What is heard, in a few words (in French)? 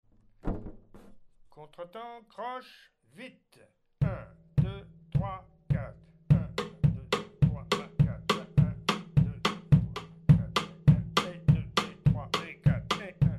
contretemps croches vite